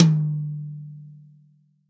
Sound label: TOM, Real Drum Kit, POP KIT